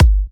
G, kicks; markus hakala kicks

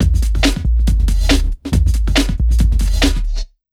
Big Beat Drumloops, 140

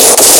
Boom!; Jikkyou Oshaberi Parodius (Parodius 3)

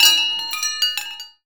CHIMES, JAPANESE PERCUSSION; AKAI S6000 CD-ROM - Volume 5; Ethic

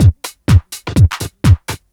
loop 125bpm, House Drum Loops

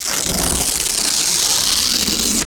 torn tape; Total Control - Action Cutz; REACTION

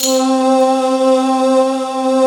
tambo vox C3; BRONXOVOX; Synth

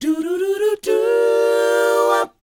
DOWOP C, Vocal R&B DOO WOP; ILIO; Vocal Planet

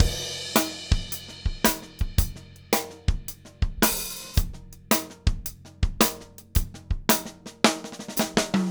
TRIP, 165 TRIPLETS; Steve Gadd Drumscores

